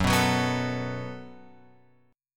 F sharp Minor Double Flat 5th F sharp; F#mbb5 Chord {2 2 4 4 X 5} strummed

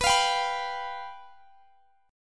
F Suspended 2nd Flat 5th, B

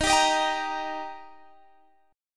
D sharp Major D sharp, chord

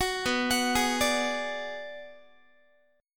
B Minor 6th B, Guitar; Bm6 arpeggiated

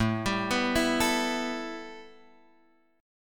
A 7th Suspended 2nd A Guitar Drop D, chord

